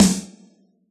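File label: snare drum rock, Yamaha DJX Rock Kit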